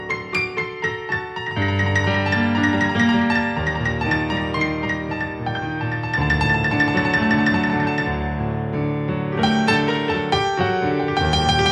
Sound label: Piano